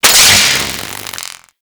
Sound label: Broken Light Zap; Warframe